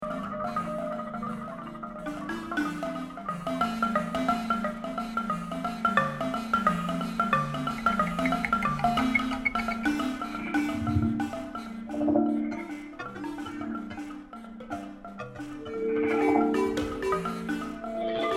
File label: gyil excerpt; Gyil and Computer; No. 7 for Gyil and Computer; Helmuth/Otte; Sound Collaborations on Centaur Records